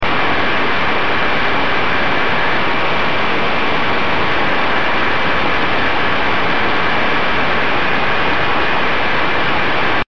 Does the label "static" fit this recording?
Yes